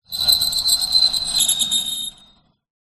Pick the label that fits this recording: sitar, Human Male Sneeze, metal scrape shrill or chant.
metal scrape shrill